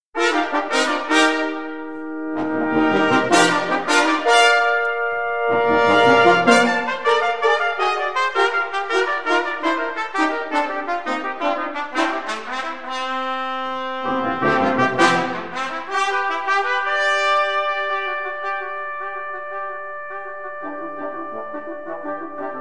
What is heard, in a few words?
Brass Menagerie